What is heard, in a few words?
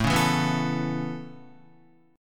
A Major Add 9th A Guitar, chord; Aadd9 strummed; JGuitar